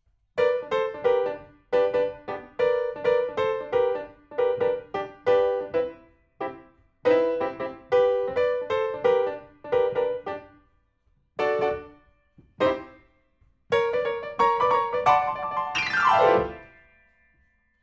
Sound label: PIANO, RNR for piano